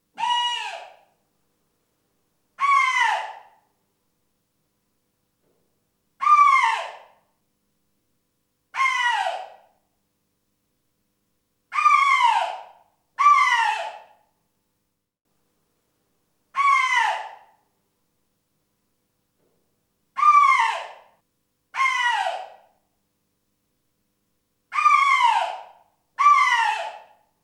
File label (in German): Ranzbeller Ranzschrei Fähe; stekani lisaka a lisky; Vabnicky zvuky